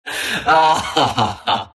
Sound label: laugh; Winter Wyvern